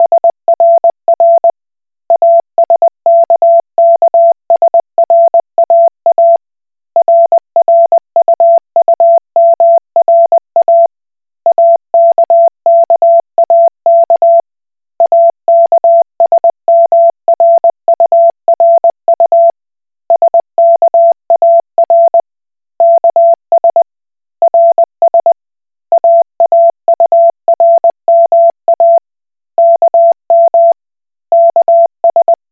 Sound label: Koch Morse